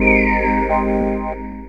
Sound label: RHODES; E-Lab; X Static Goldmine 2